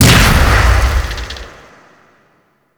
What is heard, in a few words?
Fist Ground Slam; Warframe; Mummy